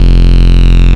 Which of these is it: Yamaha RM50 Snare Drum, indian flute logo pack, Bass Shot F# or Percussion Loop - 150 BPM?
Bass Shot F#